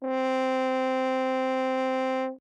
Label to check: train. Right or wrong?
Wrong